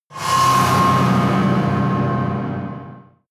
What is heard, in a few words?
whoosh scifi ring